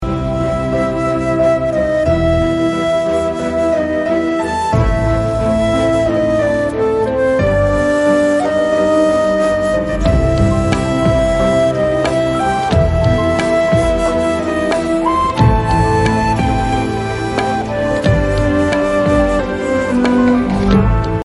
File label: flute ringtone